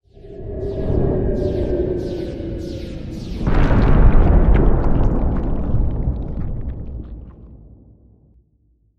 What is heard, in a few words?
Astral Star Fall